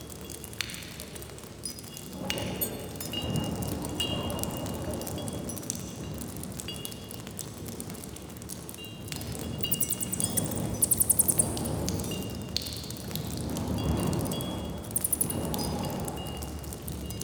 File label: Ostron Ambient Loop, Exterior Eidolon Plains Ostron Village Ambience; Warframe